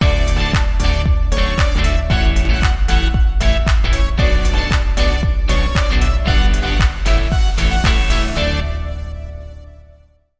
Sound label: Summer Synth Pop; Bumper; MIUI14; HyperOS